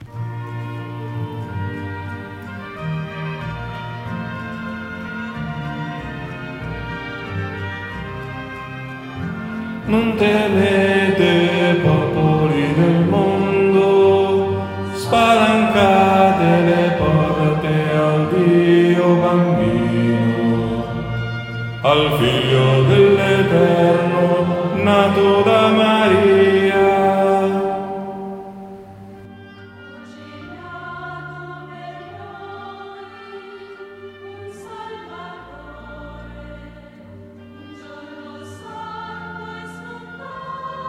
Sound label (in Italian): Non temete popoli del mondo Bassi